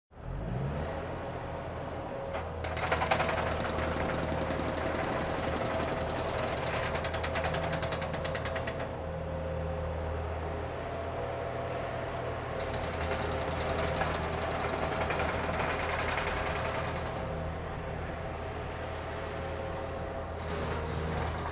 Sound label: Loader